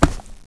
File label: tile; valve